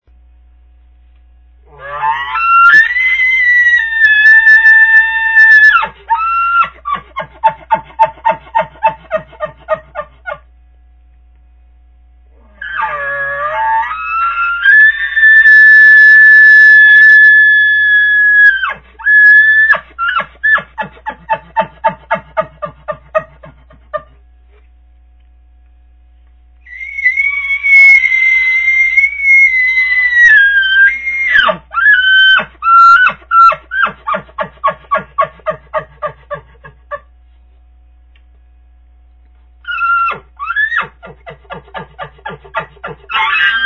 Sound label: bull, elk; elk contest; elk 2001